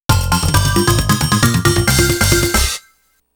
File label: Small Success!; atelier annie alchemist in sera island original soundtrack